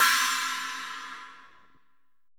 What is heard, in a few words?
CYMBL THANG; R+B KITS2